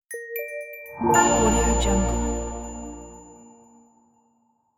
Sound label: expressive logo